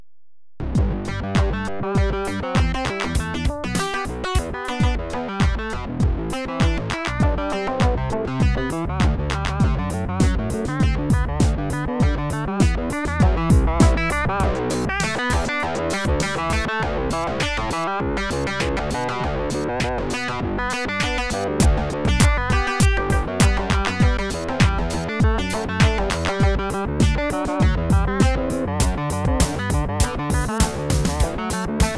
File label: Bach Hardhouse